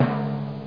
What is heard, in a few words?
drum